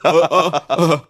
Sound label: laughter group of men; ooh ahh